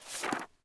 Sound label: Book Open; Civ4 warlords